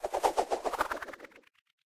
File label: scythe die; monsters